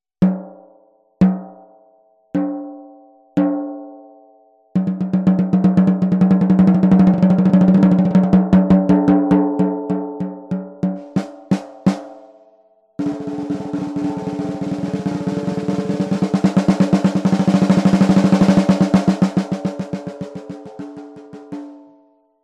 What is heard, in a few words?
PIGLITE ACRYL 13X7, CAISSES CLAIRES